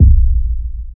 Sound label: Gloss Stomp Sub Firm